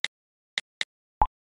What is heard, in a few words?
typing